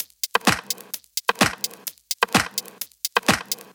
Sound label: Drumloops 128BPM